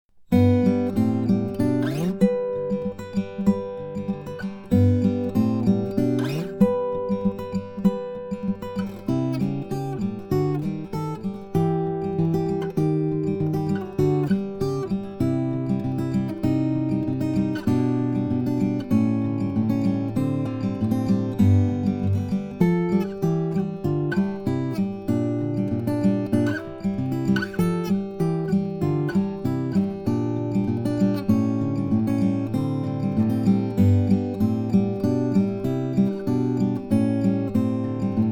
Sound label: finger, guitars